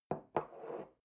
coffee cup place soft

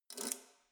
letterbox metal key pull out

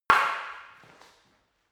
staff wood fighting single

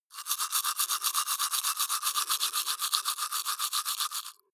teeth brush dry open molars

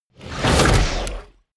sci-fi click metal ready

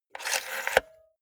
cassette player antenna retract fast

